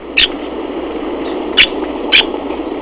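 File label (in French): bécasseau semipalmé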